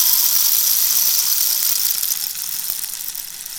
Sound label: African Rain Stick Pou